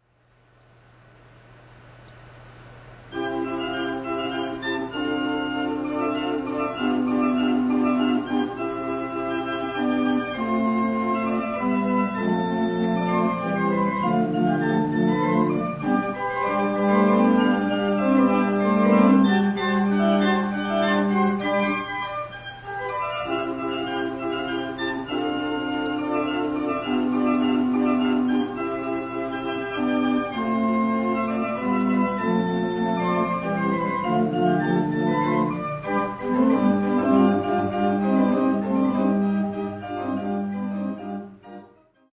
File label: prelude in classic style, organ